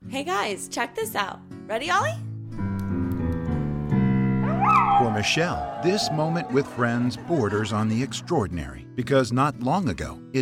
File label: Piano